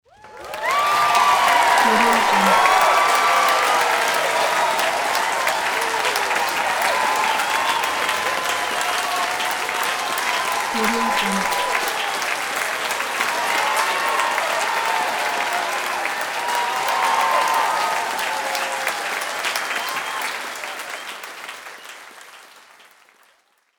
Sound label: applause cheering